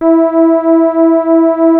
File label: Prophet 5 E5, Synthesizer; Hollywood Edge - Giorgio Moroder Rare Synthesizer Collection